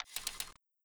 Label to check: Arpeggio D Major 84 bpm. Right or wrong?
Wrong